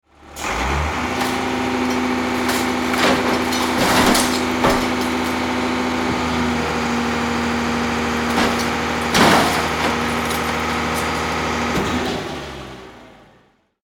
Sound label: Garbage truck loading trash garbage collection; construction vehicles